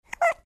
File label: squeek